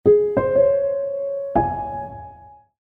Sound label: melody piano; Sandvik